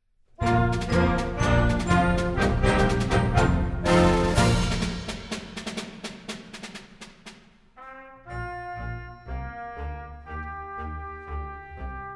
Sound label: March